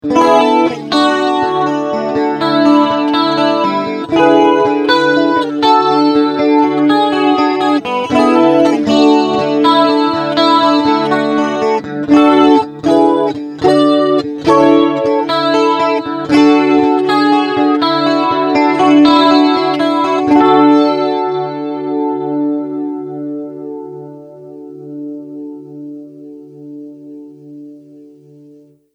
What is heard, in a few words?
Dual Chorus